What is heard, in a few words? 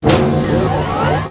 door open